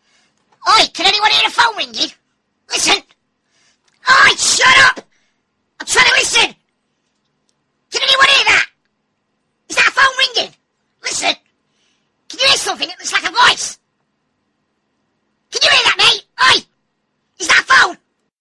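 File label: is that a phone ringing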